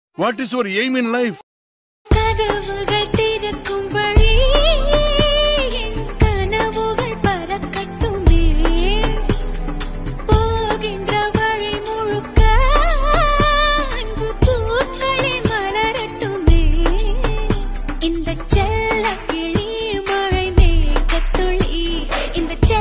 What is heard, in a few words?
tamil song